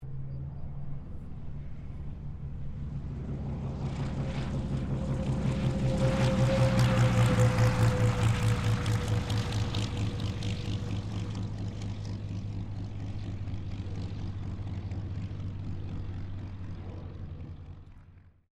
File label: AIRCRAFT P-40 KITTYHAWK LAND; P-40 Kittyhawk / Warhawk / Tomahawk; Airplanes; Vehicles